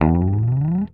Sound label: HOFNER, Bass